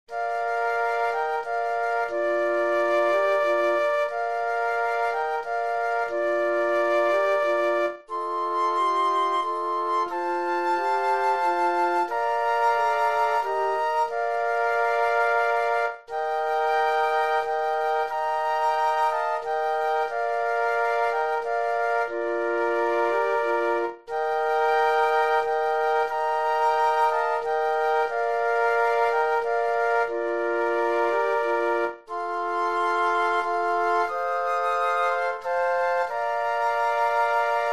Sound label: Flute Quartet; christmas